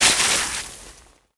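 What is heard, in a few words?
movement pick ice snow debris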